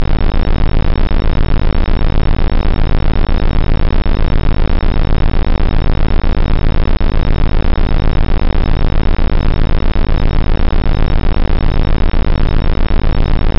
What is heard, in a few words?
Binaural 18.82Hz 26.62Hz; Corona Audio Antidotes